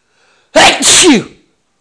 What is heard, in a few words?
Sneeze